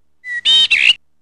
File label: correct; Beyond Atlantis Malcolm